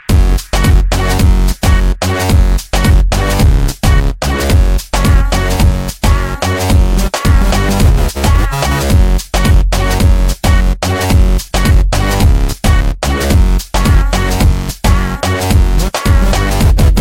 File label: Crazy Ringtone